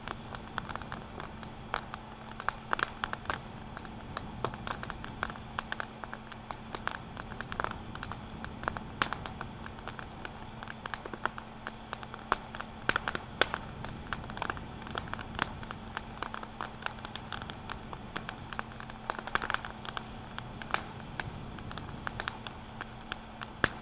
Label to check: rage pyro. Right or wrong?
Wrong